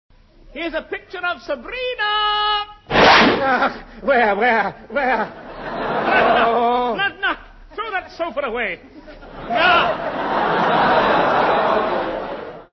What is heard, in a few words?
whoosh